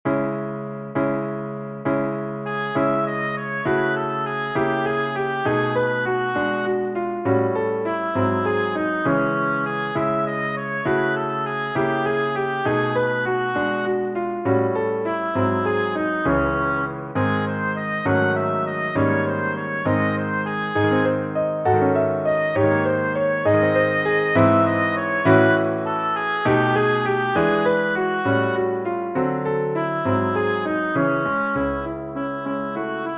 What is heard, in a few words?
for oboe piano; faure gabriel bord 039 eau